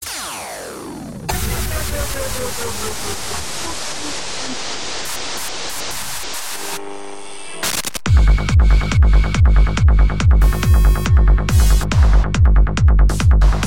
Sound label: trance